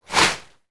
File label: Whoosh